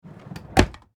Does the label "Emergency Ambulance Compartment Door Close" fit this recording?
Yes